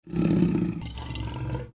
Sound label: watcher idle